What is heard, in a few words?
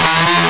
chainsaw